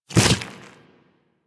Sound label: Infested Small Land Default, Footsteps; Warframe